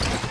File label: mecha footstep